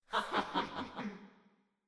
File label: Laugh; Lust Princess